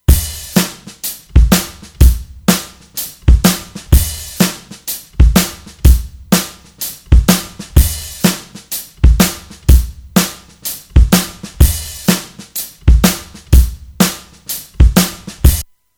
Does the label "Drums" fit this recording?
Yes